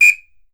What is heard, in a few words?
Whistle Hi Short; Digital Sound Factory